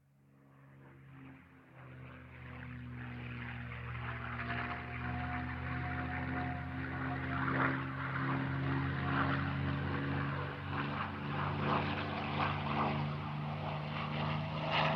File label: transportation aircraft military spitfire flying overhead; sound effects one